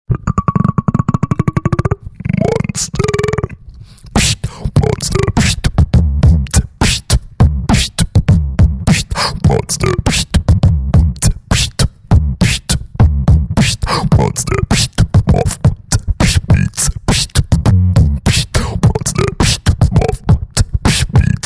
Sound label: beatbox